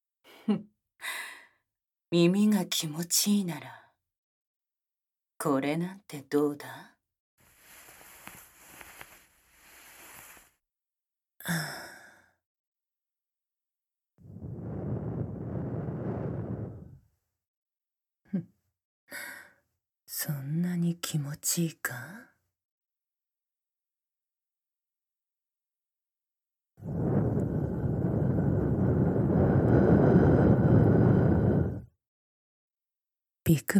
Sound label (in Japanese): 耳かき 尋問（バイノーラル）